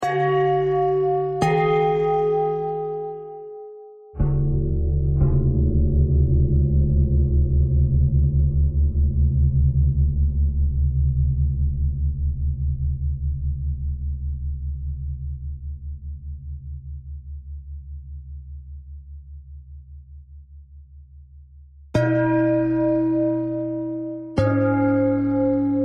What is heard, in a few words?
bell thread bend thread